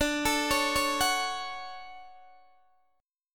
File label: D Major 7th, D, down, Guitar DADGAD